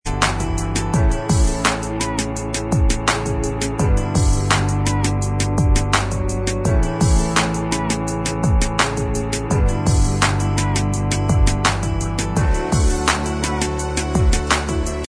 Inst Track

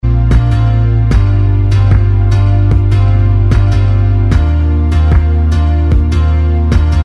Inst

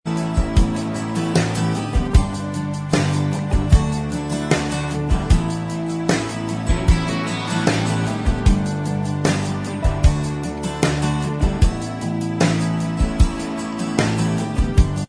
Inst

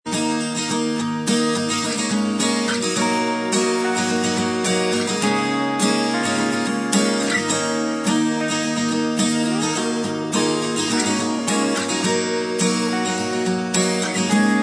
Inst Track

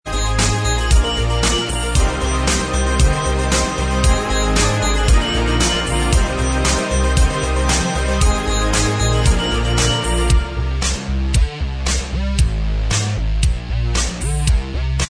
Inst

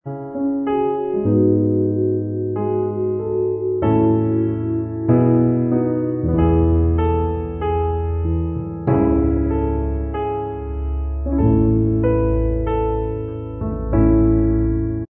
Inst Track

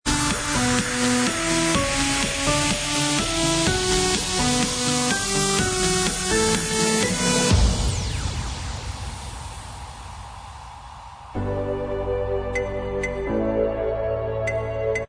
remix Inst Track

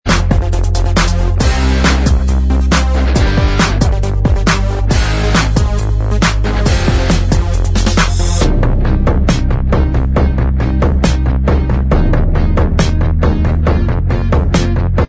Inst Track

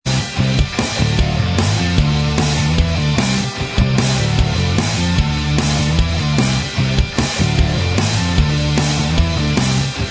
Inst Track

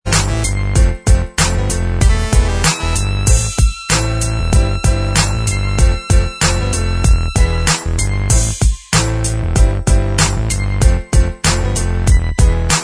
Inst

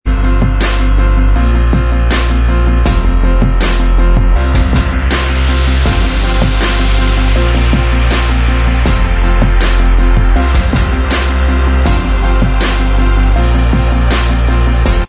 Inst Track, backing tracks

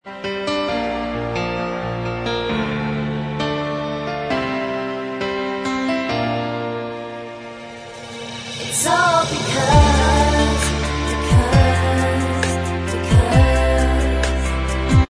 Bvox Track

